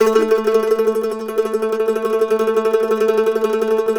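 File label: mandolin tremolo A3; Sampleheads; Whole Lotta Country 1 & 2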